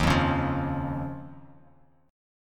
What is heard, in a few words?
Eb 7th Suspended 2nd, chord; 11,8,11,10; Eb7sus2 strummed; JGuitar